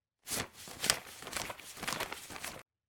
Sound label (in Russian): zvuk deneg